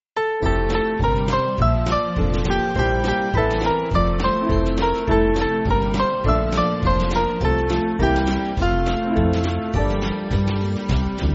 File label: Band, Music Backing Files for Churches; Joy Joy Joy